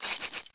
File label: usp silencer off